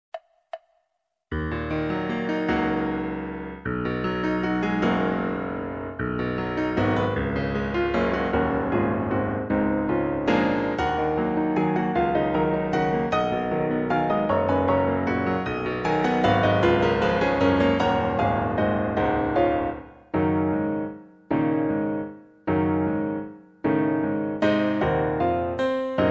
clarinet sonata; brahms

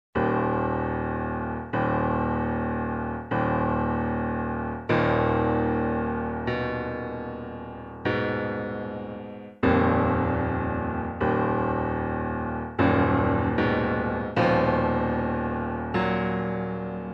clarinet sonata; saint-saens

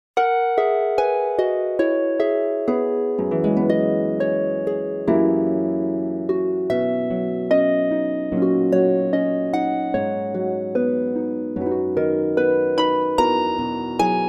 kazabue II harp

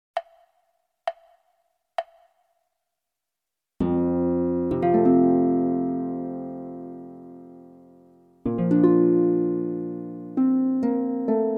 traumerei harp

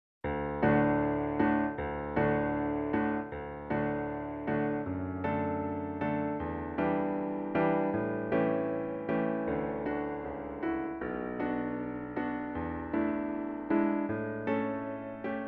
salut d'amour cello; elgar

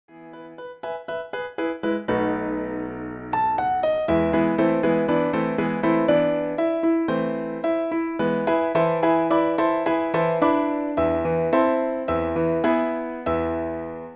arpeggione sonata

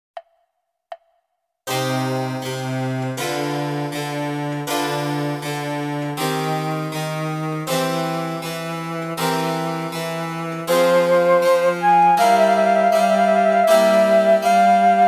bach trio sonata

